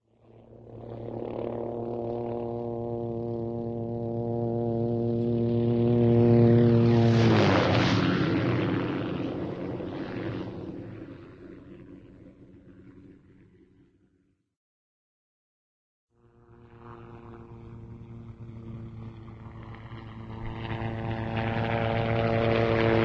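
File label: helicopter hughes exterior strafe by; cartoon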